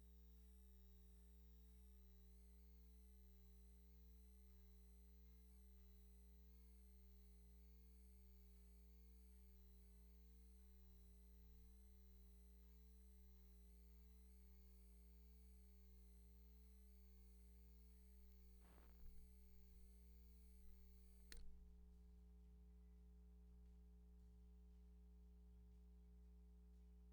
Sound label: Bass DI